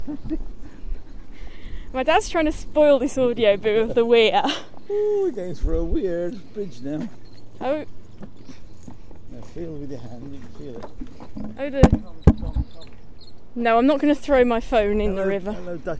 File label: River Walk 4. Includes birdsong, minor accidents, embarrassing singing and fruit discussion